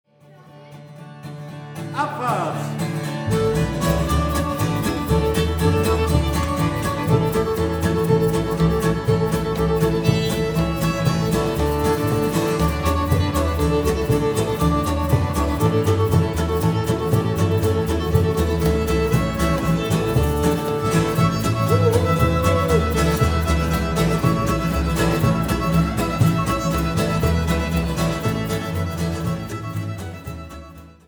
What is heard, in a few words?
two step, fiddle folk; Mamou